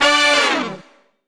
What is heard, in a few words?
D STAB, Funky Underground